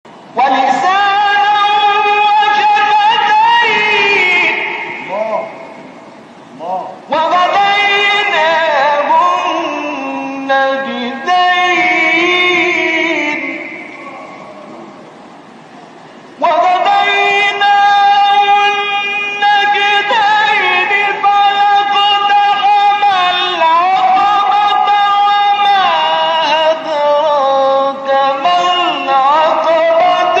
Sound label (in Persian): تلاوت سوره بلد; شعبان صیاد